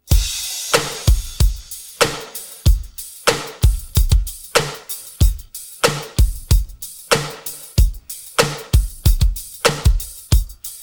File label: pattern, drums